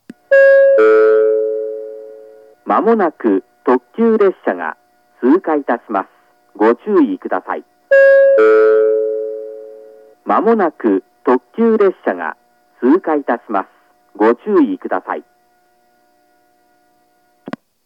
pass limited express, station; aoyagi; jr-east

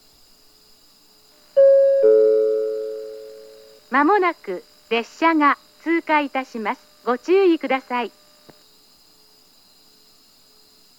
kuroi pass, station; jr-east

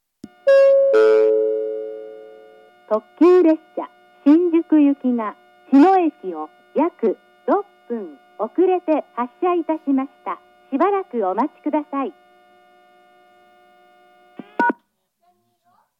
limited express; jr-east; fujimi; shinjuku

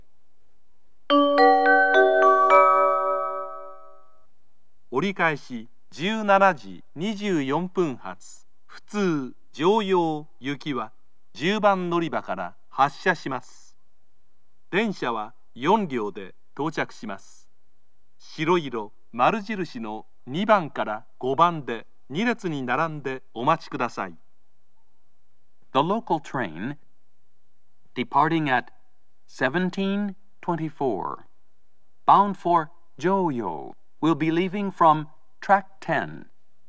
kyoto turn local, station; joyo

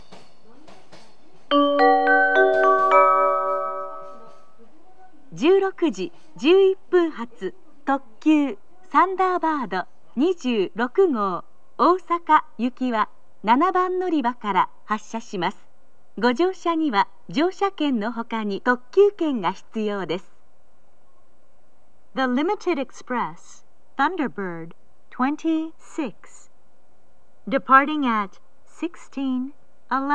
limited express, kyoto, station; osaka

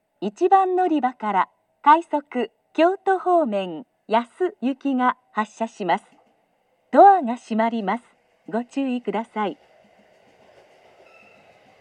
rapid; ashiya; yasu

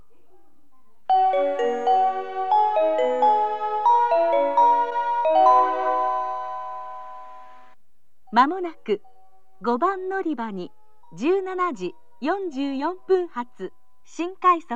special rapid service, station, Kyoto; banshu-ako

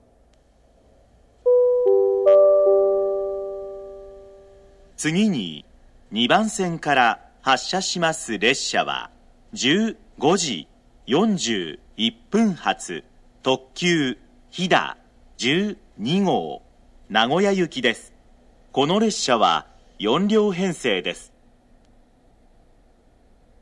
limited express; jr central; gifu; hida12

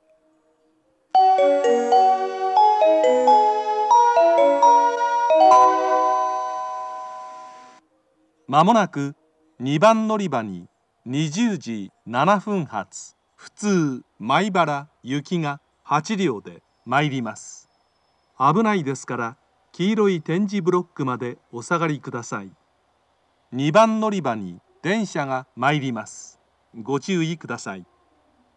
local maibara, kyoto, station

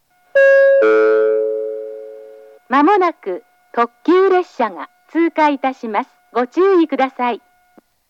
pass limited express; murai; jr-east

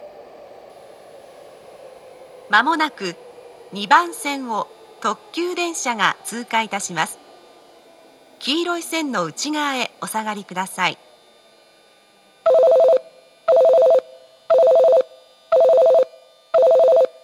pass limited express, station; tammachi

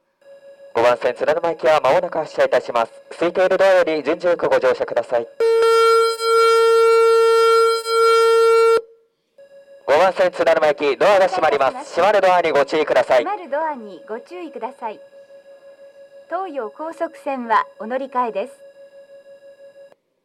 nishi funabashi, station

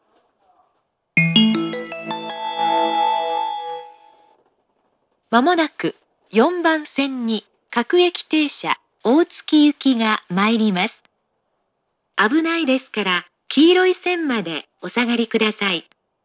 local, station; 八王子駅 4番線; 接近放送; 各駅停車; 大月; jr-east

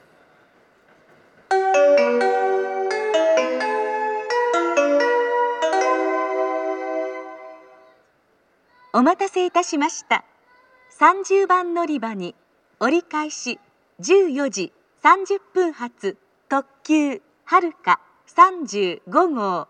turn limited express, kyoto station; kansai-airport; haruka35

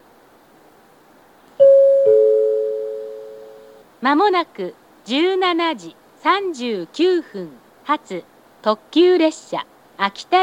limited express; sakata; akita; jr-east

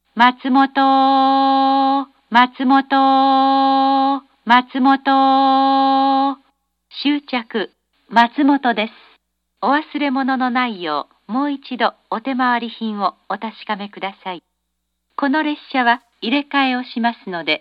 matsumoto stop, station; jr-east

